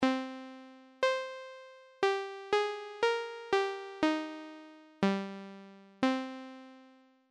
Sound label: ADSR; synthese sonore les enveloppes